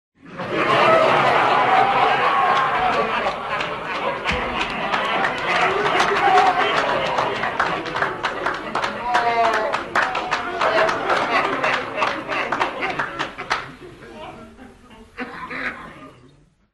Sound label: laugh track